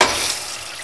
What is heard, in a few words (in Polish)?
RYBA